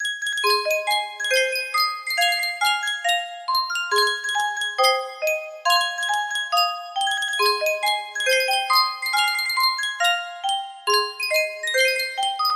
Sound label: Sankyo music box